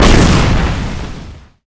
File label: tank missile exp, strogg; Quake 4 Alpha; id software